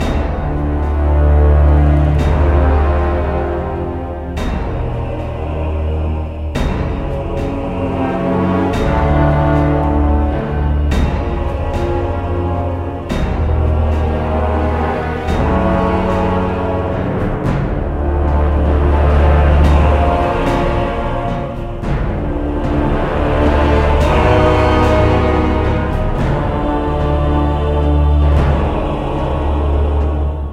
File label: Cutscene Loop; The Desert; prince of persia 2008 gamerip